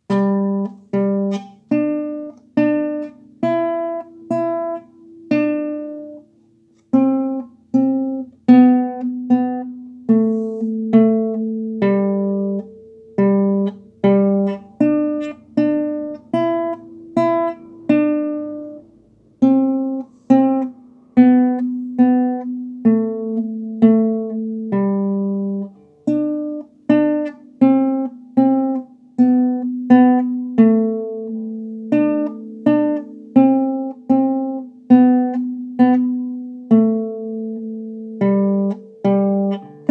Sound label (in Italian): traccia chitarra